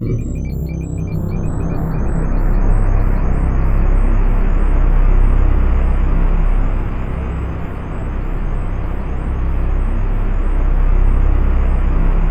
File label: spaceship, ship drones; Zero-G Ambient 1